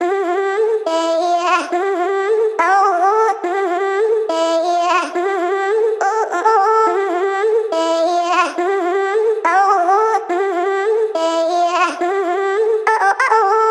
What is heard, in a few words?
Dmin 140BPM